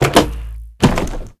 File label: airplane panel drop